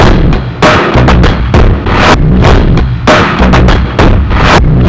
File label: EXTREMELY ABRASIVE BEATS